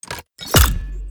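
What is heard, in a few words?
enemy attack bucket gun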